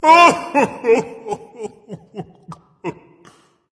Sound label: boohoo berserker; cuchulainn